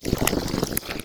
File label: imp chatter, monsters; Doom